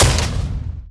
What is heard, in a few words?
hit fire; smite; god merlin